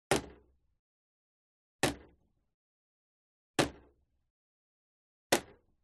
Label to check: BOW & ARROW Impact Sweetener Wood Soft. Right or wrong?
Right